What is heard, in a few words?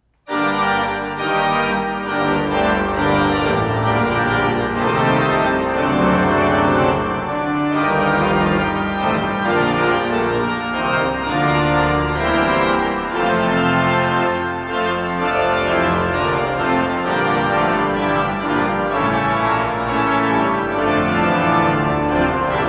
Organ